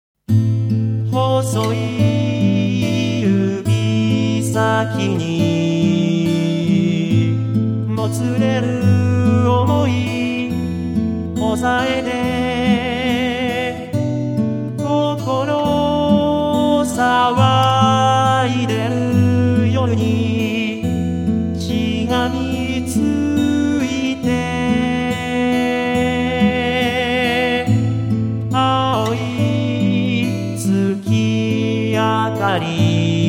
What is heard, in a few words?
vocaloid